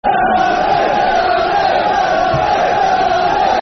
Red Army, Football Chants (Soccer Songs); Barnsley; Barnsley Bfc

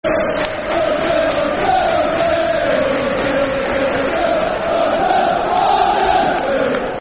Huddersfield, Huddersfield, Football Chant; Soccer Songs; fanchants; Huddersfield Town Htfc

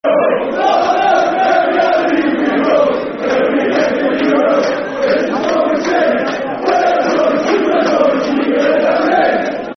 Football Chant (Soccer Song), Newcastle United; E-I-E-I-E-I-O; Nufc

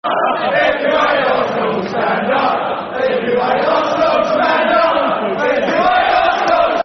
Football Chants (Soccer Song); Stand Up If You Hate Arsenal; Tottenham Hotspur Thfc

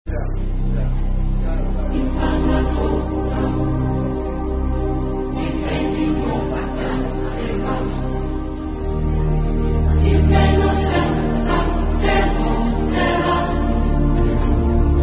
Spanish Rugby Song; Sic 61 Argentina

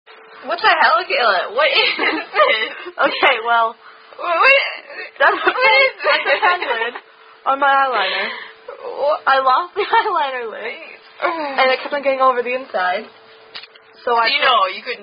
Chinese Japanese Dirty Knees Look At These

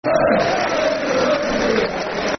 Is A Wednesdayite, Football Chants; Coughlan Is A Wednesdayite; Sheffield Wednesday Swfc

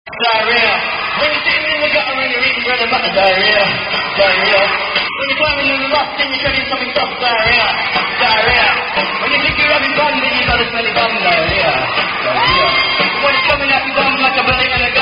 Diarrhea Song

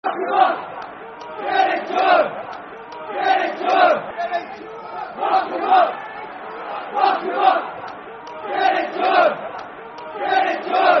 Get Into Em [Football Chant (Soccer Song)]; Aldershot Town Afc

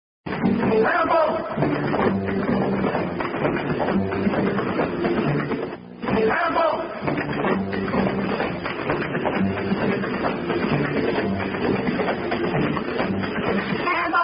Hambone, Sound Off

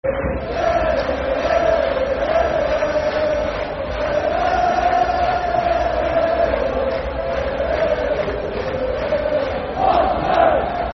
Football Chant (Soccer Song) N. Ireland; N. Ireland N.i.f.a

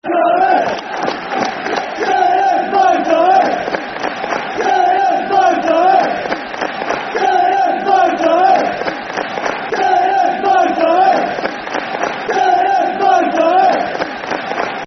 Football Chant, Soccer Songs; Sheridan's Blue And White Army; Oldham Athletic Oafc

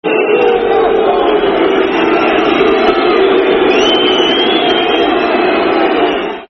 Boo, Football Chant; Leeds; fanchants; Leeds Lufc

